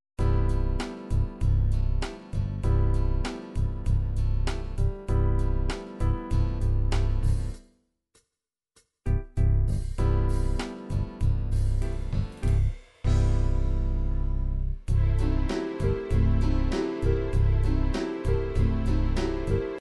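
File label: ballad 8 beat country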